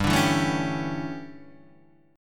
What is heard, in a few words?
D Major 7th Suspended 2nd Suspended 4th